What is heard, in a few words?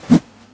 hammer swing